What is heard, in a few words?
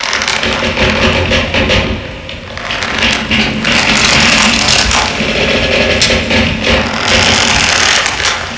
creak loop